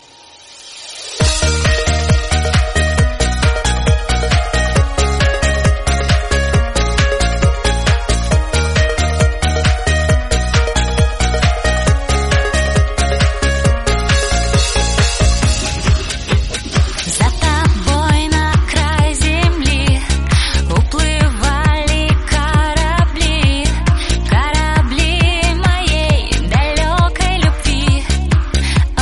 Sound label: russian song